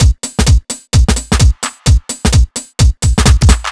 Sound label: Fill, Drum Loops 129bpm; Zero-G; Soundclash Nu Dance Electro; Acidized Wavs; Typewriter